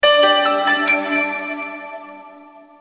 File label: Relaxed Pianola; Blackberry Bold